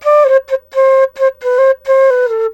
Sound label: SERULING, Heart of Asia